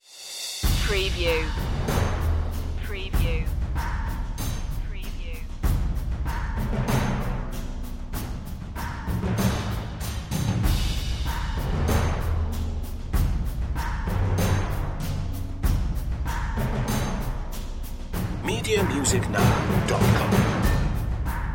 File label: perc mix